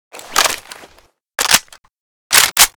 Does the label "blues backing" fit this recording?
No